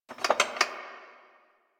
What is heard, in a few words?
Mug Clink; Ambience; Warframe; The New War; Quests; Zariman